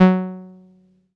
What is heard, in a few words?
505, String Machines